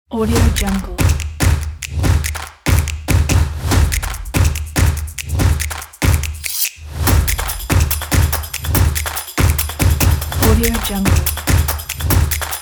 energy drums promo trailer

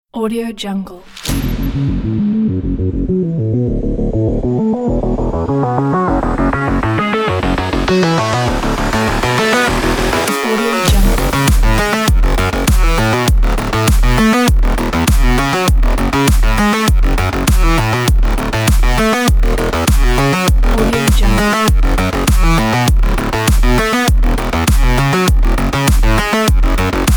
technology future robot

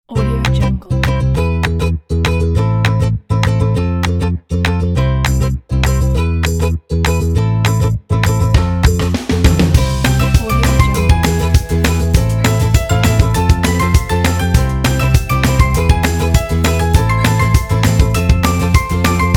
happy upbeat

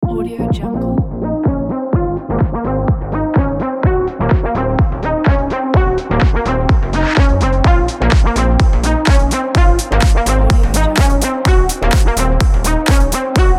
pumping electronic club track

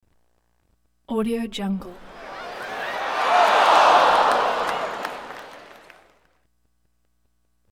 crowd astonished reaction

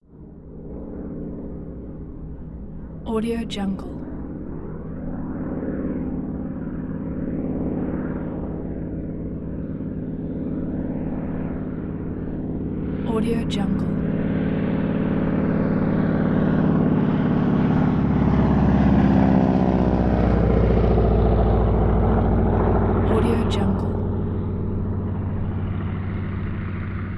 Stearman biplane

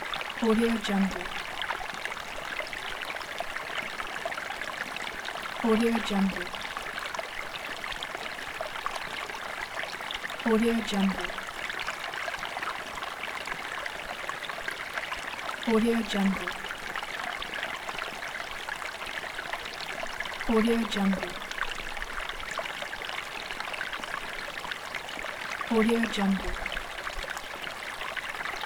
creek

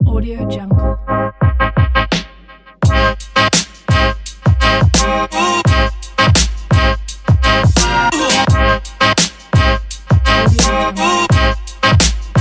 vlog hiphop logo

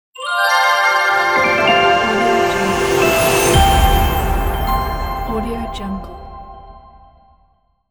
flowers logo reveal

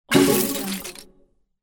cash add ding; fxprosound

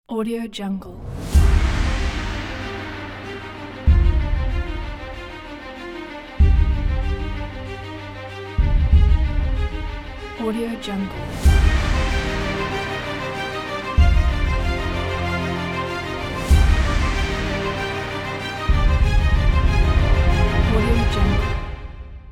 epic heroes; musickidsound

